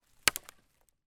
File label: impact small branch twig snap with foot